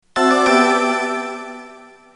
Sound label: alarm